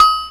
MR16 HI AGOG; Back In Time Records; Korg Universe VOL-1; DRUM FAMILY

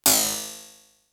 Percs, Elektron MachineDrum SPS1 MKII

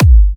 G, Kicks; markus hakala

